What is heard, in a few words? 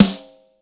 kit, Yamaha Motif-ES8